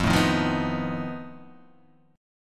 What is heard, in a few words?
F 7th Flat 5th F down